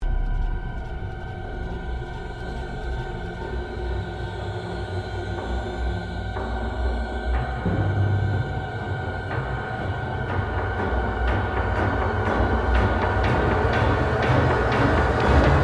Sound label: Suspense Build Drama Production Music